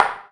Smash; Table Tennis Pro Lite

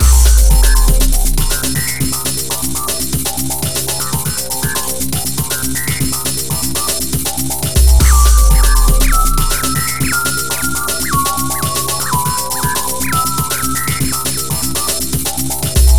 JUNGLE RUMBLE

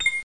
trg lock

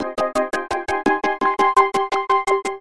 PadStract C, Abstract Loops; Cyclick Samples; Kit Two

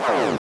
LASER; Gunlok

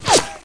bullet by; Soldat

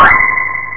bonus; insectoids2

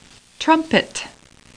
Trumpet

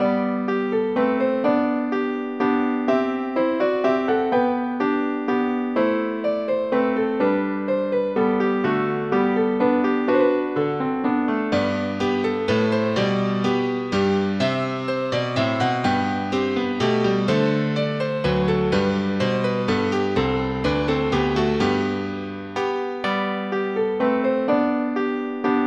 minuet in g

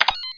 button

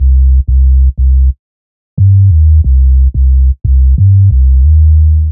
TR36 Bass Line; maudio; Hella Bumps

